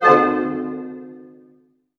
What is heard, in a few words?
harp hit A DIM; Sound On Sound - Peter Siedleczek's Orchestra